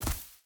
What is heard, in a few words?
footstep boots grass jump land; Rust